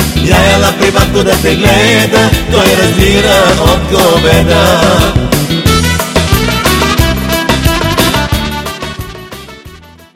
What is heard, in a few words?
win, music